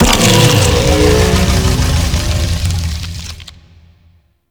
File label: Sentient Condrix Close; Squad Link